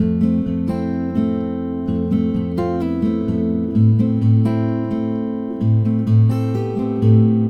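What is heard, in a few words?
Acoustic Latin Guitar 128BPM A minor; Acoustic Latin Guitars; Vengeance; Essential Clubsounds Vol.4; Guitarloops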